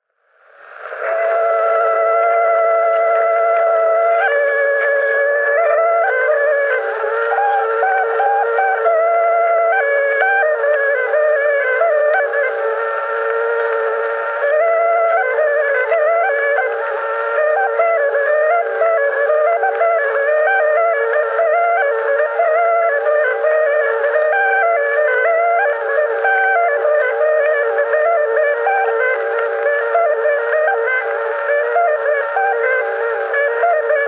Torupilli Jussi lugu, Emmaste, torupill, folk; Juhan Maaker

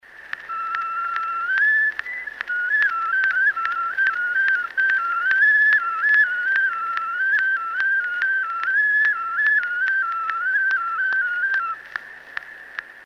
Pillilugu, vilepill folk; Kuusalu; Mart Masurin

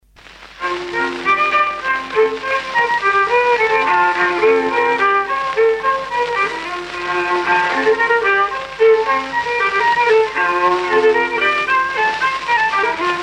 Oppvalts, viiul, folk; Kuusalu

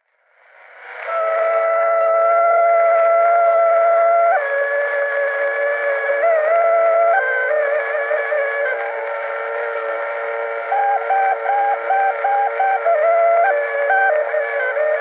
Torupilli Jussi lugu, Emmaste, torupill, folk

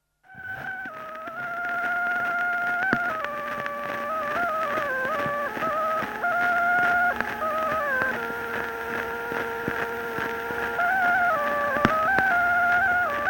polka Kuusalu torupill folk; Palmse polka

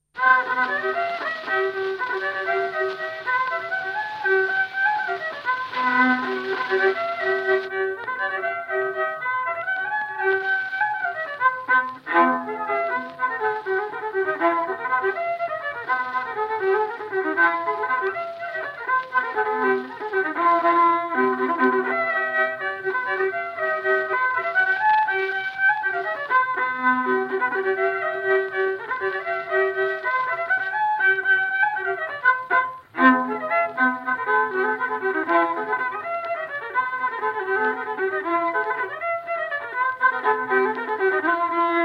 vlats, viiul folk; Kihnu; Kingu; valts

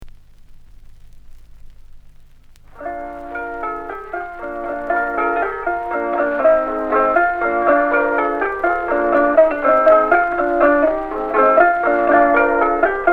labajalg, kannel, folk; Kirbla; Torupilli Jaani labajalg

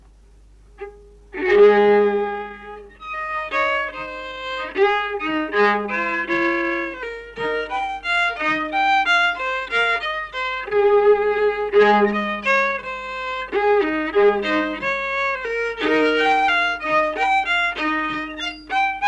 Kalameeste valss viiul; Ruhnu; folk

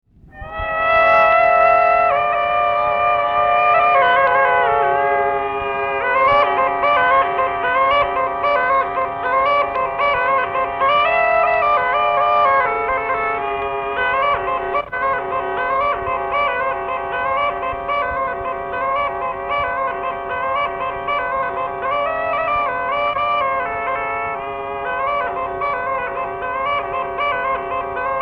Loppevalts Kuusalu torupill folk

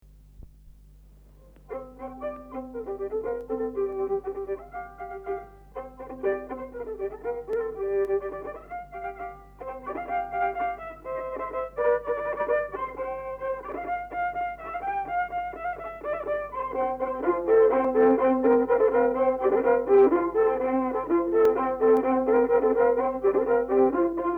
Vilbomm polka Paistu viiul folk

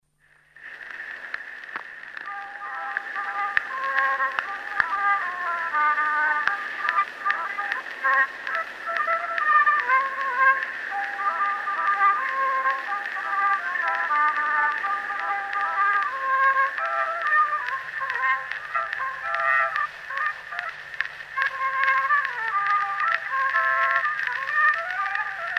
Ingliska, folk; Kuusalu khk; Eduard Aaman

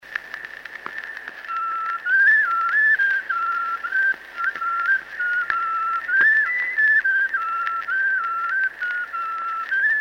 Kuusalu vilepill folk; Tule lilli tudu; Mart Masurin

